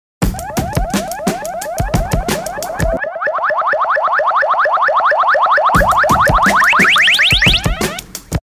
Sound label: beat sync example dry